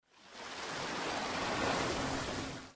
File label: ocean Waves crashing on the beach sound effect